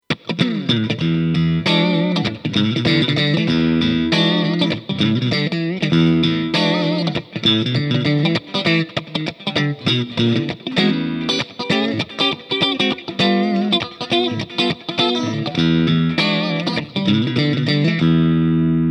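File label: Clean Both Pickups Single Coil Humbucker, guitar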